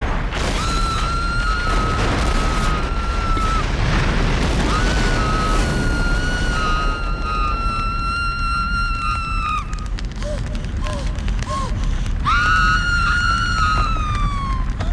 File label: scream